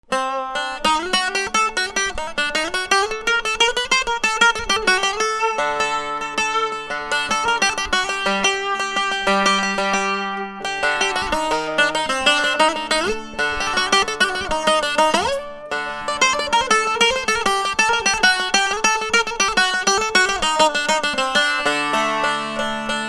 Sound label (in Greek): Τζουράς δάκρυ - γόνατο καρυδιά, τζουράς από καρυδιά; Μήκος ταστιέρας: 64cm; ΚΑΠΑΚΙ Α Γνήσιο; ΣΚΑΦΟΣ Α Γνήσιο; Ξύλο καπακιού Α; Ξύλο σκάφους A; Αριθμός ντουγών 16; μουσικά όργανα - τζουράς